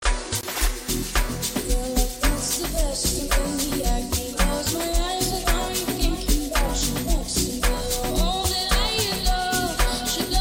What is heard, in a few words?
Piano